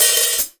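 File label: open hi hat, Real Drum Kit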